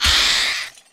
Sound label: injured